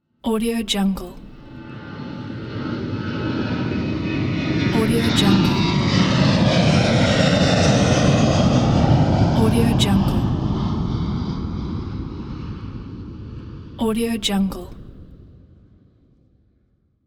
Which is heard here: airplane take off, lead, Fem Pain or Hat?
airplane take off